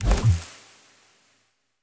Shield End

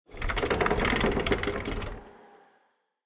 warehouse cart; idle mining empire

